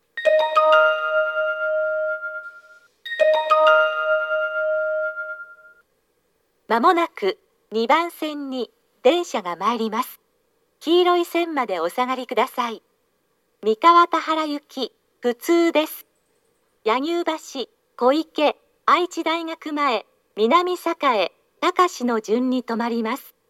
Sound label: shin-toyohashi local mikawa-tahara, toyotetsu station